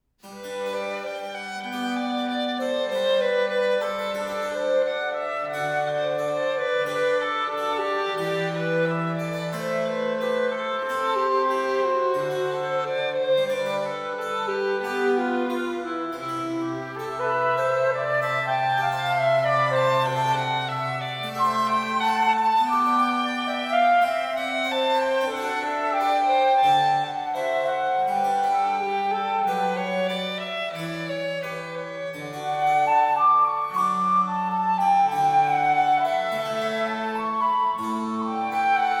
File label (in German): Triosonate BWV 526 2 Satz